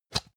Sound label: plastic can small lid open hard